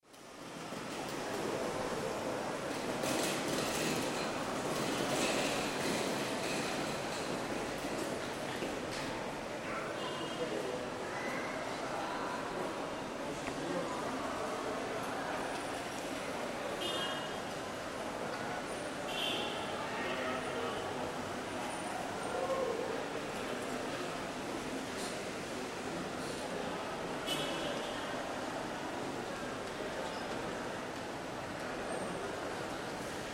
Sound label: Jakarta Airport